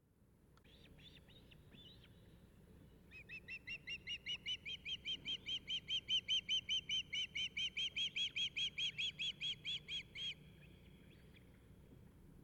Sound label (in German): Fischadler, Wildlife Recordings, Flutes; Fischadler 2012; Jürgen Hochfeld Wildlife Recordings; Northern lIghts Flutes